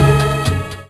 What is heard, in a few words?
STRING BD; PlatinumPhattCD1; E-MU Producer Series Vol. 8 – Platinum Phatt; Best of Phatt